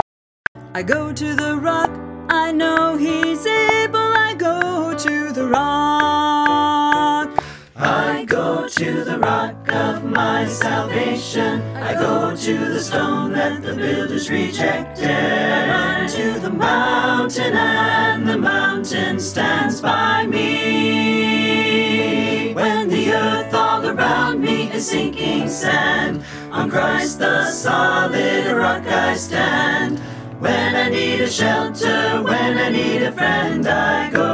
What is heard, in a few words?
rock shortened clicks